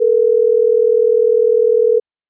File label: Ring Back Tone; HTC Ozone